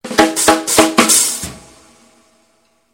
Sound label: African Fill